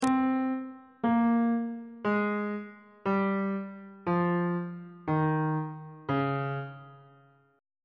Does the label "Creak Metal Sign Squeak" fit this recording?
No